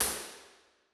Farmhouse R; Impulse Responses; Ableton Live 12 Lite; Hybrid

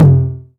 Tom 909; One Shots; Drums

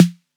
Snare, drums

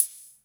Hihat Open Thin Analogue

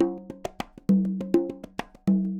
Congas; Pro Tracks

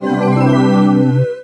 bouquet loop; There; ThereClient

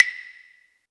Wood Feel, One Shots Drums

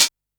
Hihat Closed

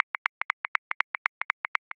Disco Light Swing 16ths; Grooves; Ableton Live 12 Lite; Core Library

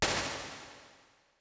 Chorus & Plate; Convolution; Large Rooms; IR Samples

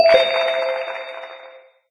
cards game over; uisounds; cardgames; There; ThereClient

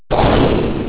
bang

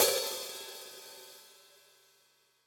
Hihat Open Twenty Crystal, drums

